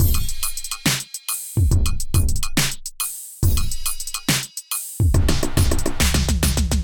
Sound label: Electrified Kit; Ableton; Bomblastic